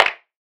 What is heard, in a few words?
clap sample f key